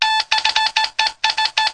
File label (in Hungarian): morze; Pure Pinball